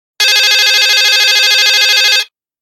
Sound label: Electronic, ringtones; Nokia; 5300 XpressMusic